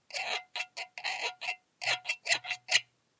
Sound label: pet guiro